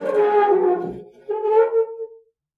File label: screech, squeaks